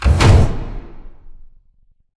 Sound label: sliding door sm verb open stop; beacon; Titanfall 2